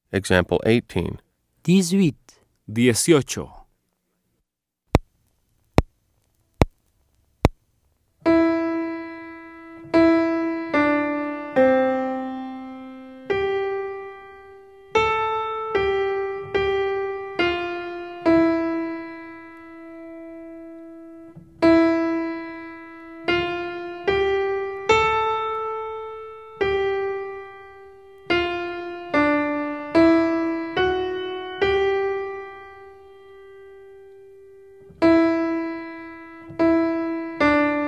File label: Keyboard